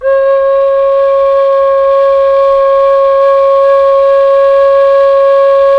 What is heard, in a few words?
KHLUI C4, KHLUI FLUTE; Spectrasonics; Heart of Asia